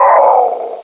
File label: wizard death; Quake